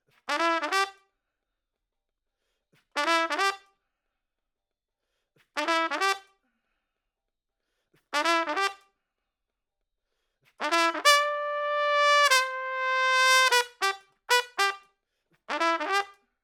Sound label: Trump